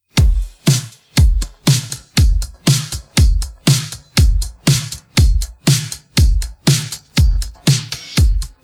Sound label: pattern, drums